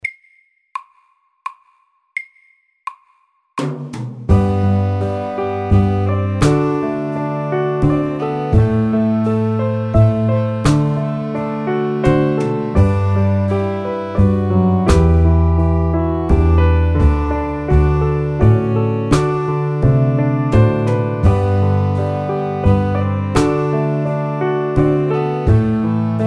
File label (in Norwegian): Soft rock MIDDELS